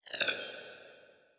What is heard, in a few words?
Multimedia Internet CD-Rom Flash Electronic